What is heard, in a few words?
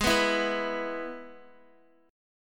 G sharp Minor Sharp 5th, Guitar; G#m#5 strummed; JGuitar